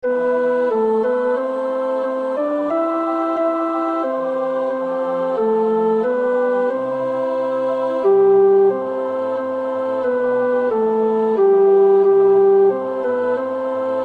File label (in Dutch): tenor; Nieuw Liedboek; NLB 583 (zonder slurts)